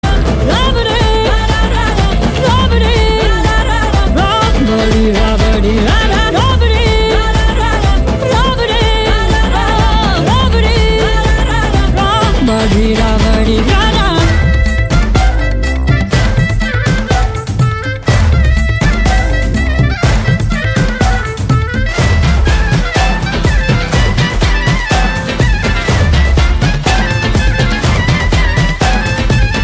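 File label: Ringtone background music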